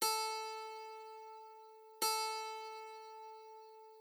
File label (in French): guitare